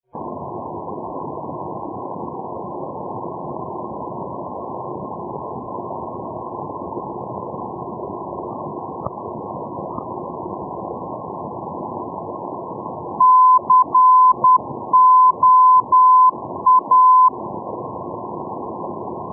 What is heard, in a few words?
ndb la coruna